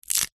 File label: horror toe or finger break; zapsplat